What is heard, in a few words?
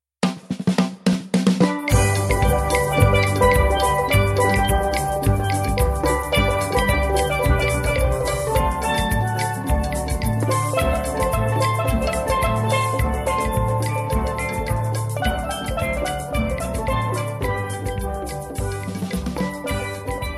steelfever pan